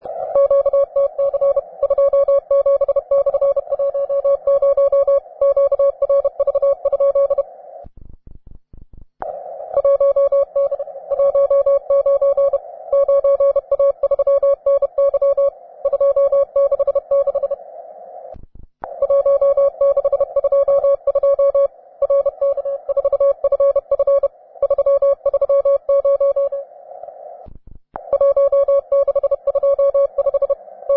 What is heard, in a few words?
cw, advanced; QTC list